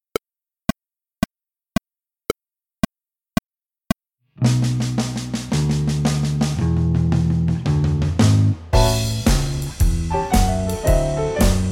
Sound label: With drums